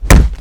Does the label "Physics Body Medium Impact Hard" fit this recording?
Yes